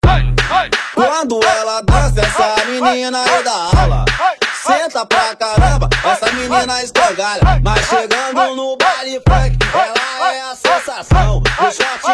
Baile Funk; Baile FunkUp

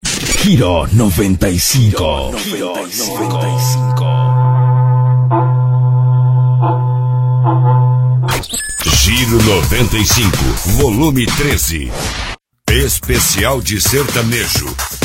Sertanejo Remix

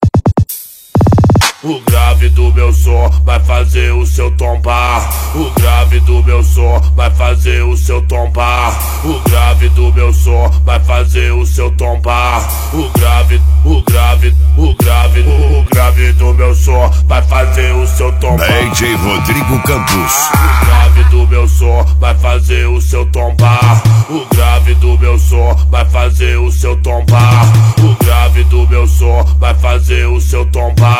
Bass Automotivo

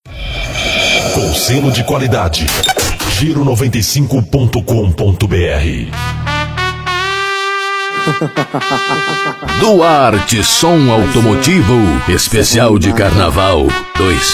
Som Automotivo

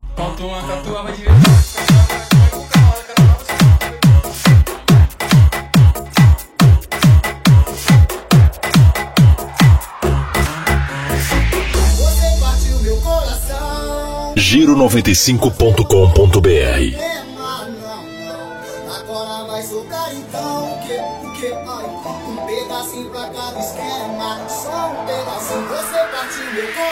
Batidão

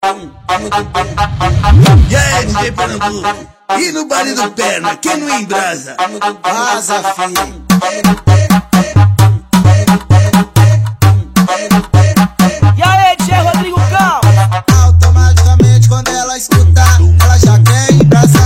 Bass Automotivo

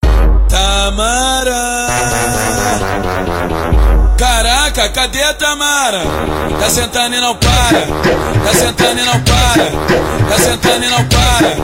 Baile do giro O Melhor do funk carioca

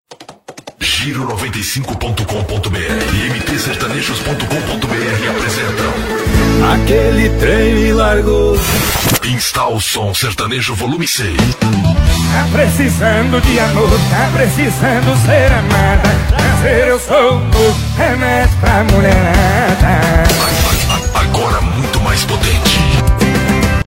Sertanejo

